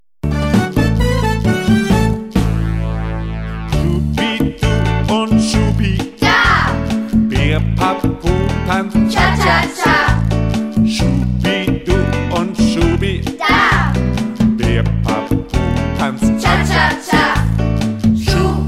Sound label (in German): zieharmonika